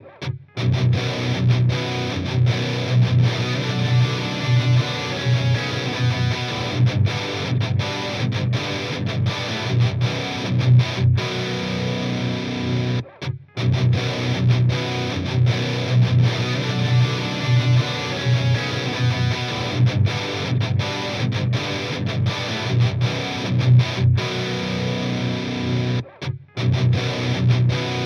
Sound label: x50 cab movement vs recab; x50 v2